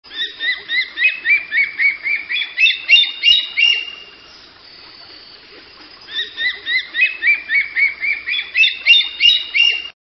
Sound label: Common Black Hawk, songs